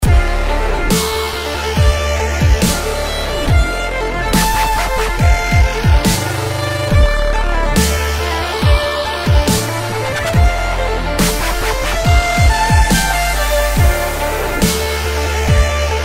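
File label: dubstep and violin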